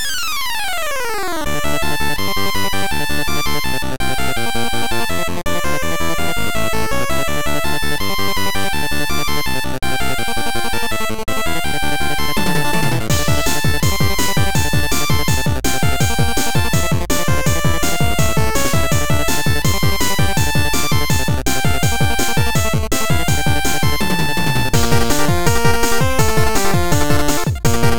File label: Nostalgia TECHNO; puchicon; dsi ds